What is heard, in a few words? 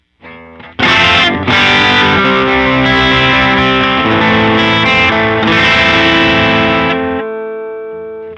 electric guitar; Joe Chiccarelli